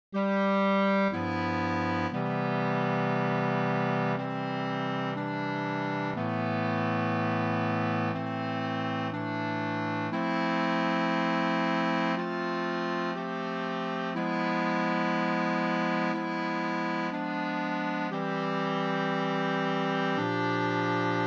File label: Extension All Parts, barbershop tags